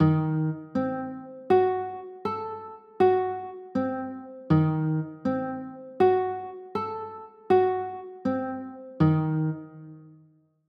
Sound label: c dim7, chords